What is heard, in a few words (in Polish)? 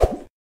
scinanie reki